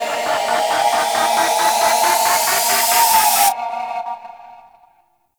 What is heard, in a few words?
Dead End Crystal Energy Riser; Echoes; Warframe; Entrati